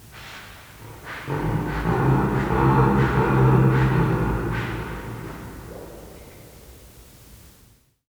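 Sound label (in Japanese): kobito kaba, コビトカバ 鳴き声; 上野動物園; 多摩動物公園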